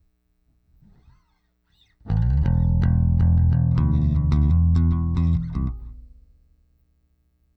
Bass, Recorded

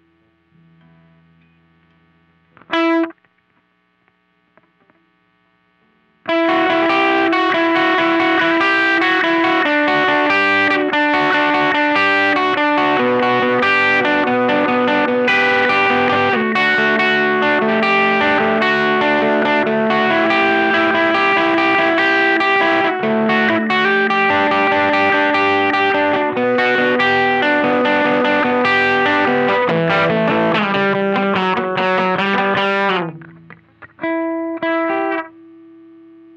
LP Riff Slow

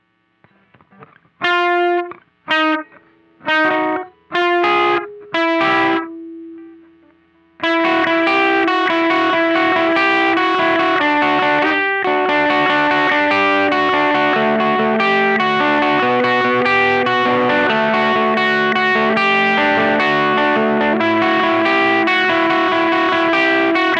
LP Riff Slow

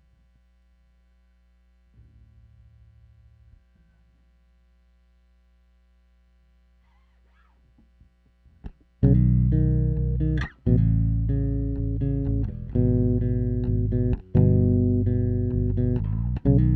bass takes

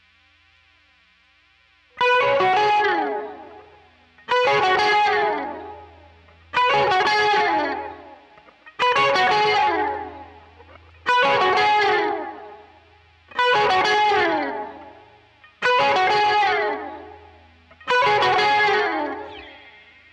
quilter solo

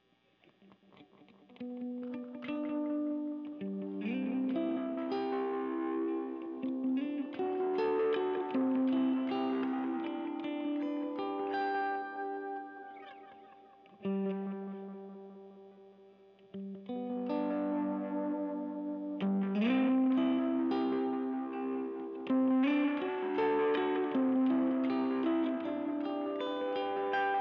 Strat 57

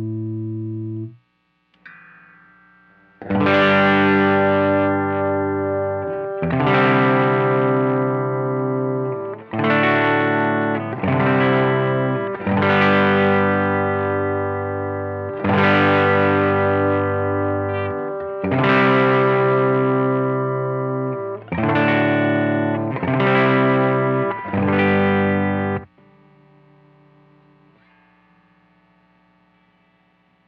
LP hold

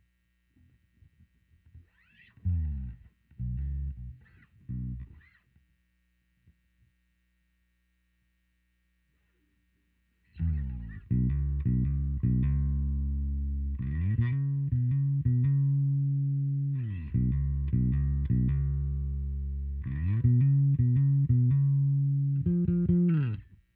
new Bass

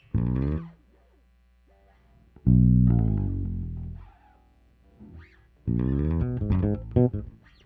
bass takes

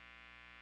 quilter norm 61

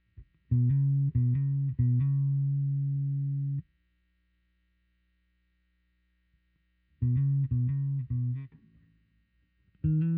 new Bass

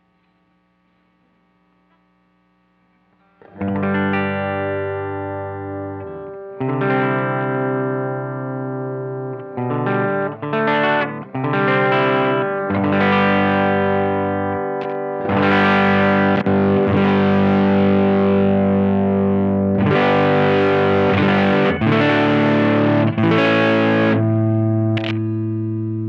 LP hold

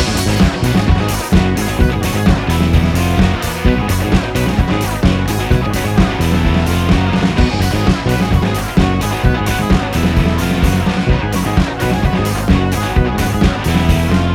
snare test

